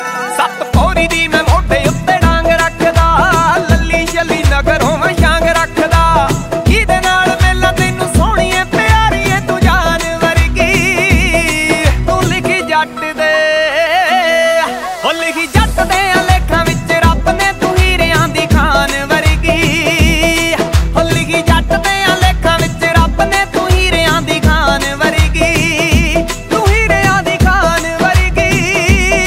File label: New Punjabi Song Ringtone